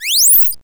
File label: Replay